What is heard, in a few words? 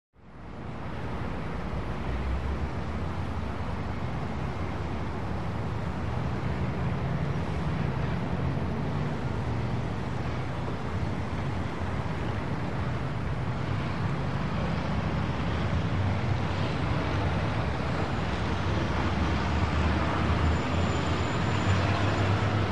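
Distant Highway Traffic, big city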